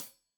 Hat Tight, Deeply Sampled Drums Cymbals Stereo Hi-Hat